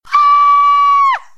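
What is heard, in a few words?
Is Calling R&B, ringtones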